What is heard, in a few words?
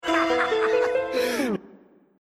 deathcam